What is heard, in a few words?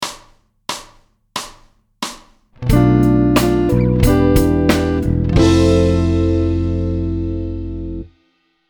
chord